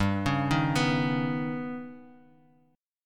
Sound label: D sharp Augmented 7th G, Guitar